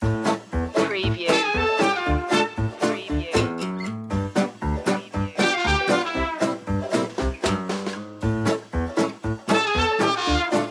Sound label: clown-o-rama loop